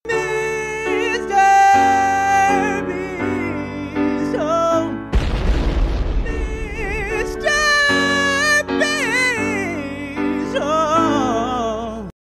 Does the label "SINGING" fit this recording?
Yes